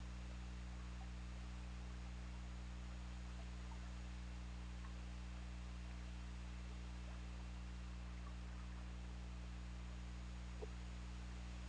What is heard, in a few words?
Orca; OrcaLab